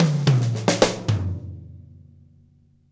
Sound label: Fills, Monster Beats; Zero-G